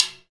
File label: Timbale Side, Roland BK-5; Percussion